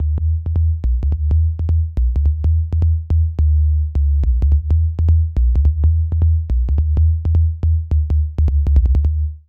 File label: BASS LP